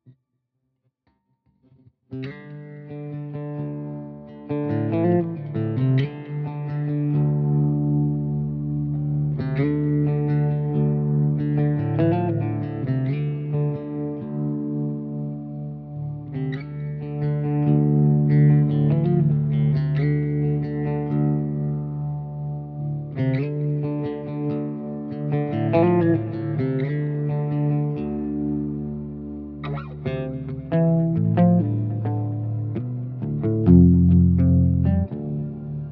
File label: Bells mag+piezo